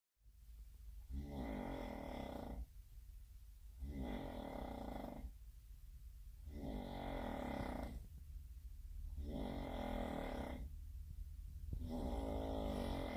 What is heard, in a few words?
Snoring Roommate